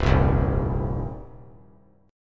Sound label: C Minor Double Flat 5th C down 3C 3F 3A 3D; Cmbb5 strummed; JGuitar